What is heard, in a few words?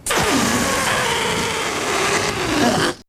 balloon deflation